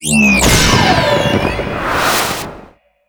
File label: Anvil Rain; MagicItem AnvilRain SFX CutScene 01